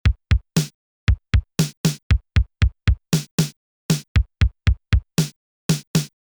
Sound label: accidental drum